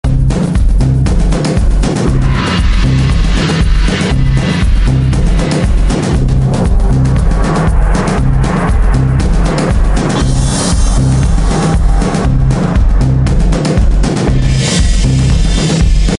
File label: Jingle Brawl Intro; Nickelodeon Super Brawl